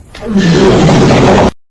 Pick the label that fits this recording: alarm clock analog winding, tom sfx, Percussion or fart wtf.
fart wtf